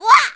Run; Killer Bee #2 running

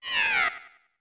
warp respawn out; OOT

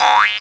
Bounce; Zill bouncing into Link; zelda sounds

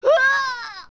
Thrown; Sheik; zelda; OOT

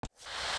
push crawl stone; zelda; OOT

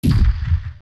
Block Drop; zelda; OOT

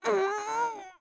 Link Stretch; Link stretching; The Wind Waker

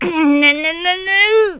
Stammer; Faux Hero stammering

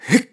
Run; Link running; Skyward Sword; zelda

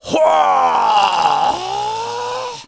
Old Man Ho Ho

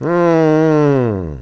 "Mmmm"; Mako (pirate); Zelda

